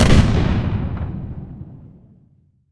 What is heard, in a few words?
impact explosion